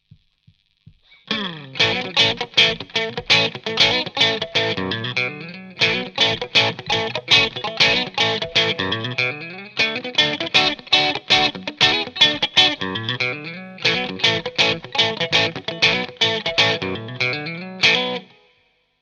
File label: EVH clean funk